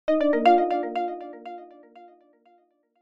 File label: multimedia alert system